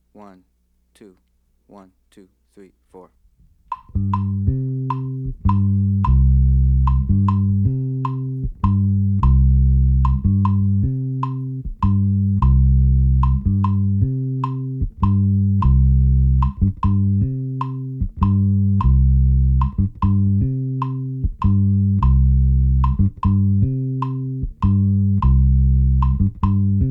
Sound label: bass mambo and son montuno basic tumbao